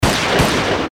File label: shot